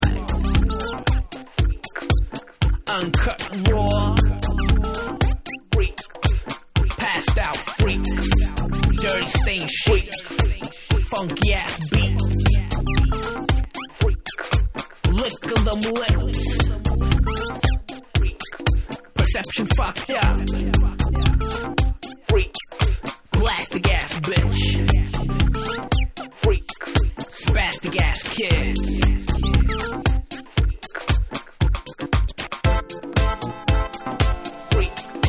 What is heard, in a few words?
dub